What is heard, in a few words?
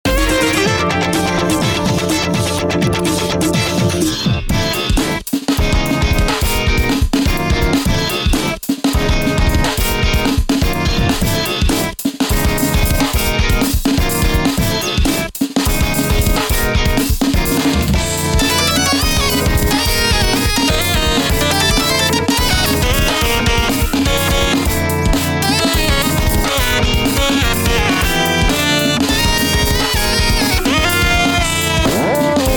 solo